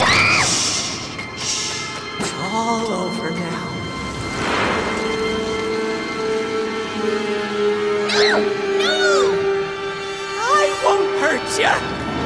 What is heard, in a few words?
Little Sister Scream